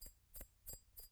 Foley Salt Shaker Shaking

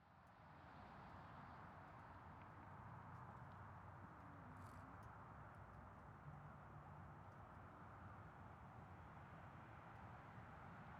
Ambience Traffic; AdobeCC

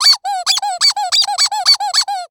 Cartoon Toy Squeaky Toy Squeaks Multiple

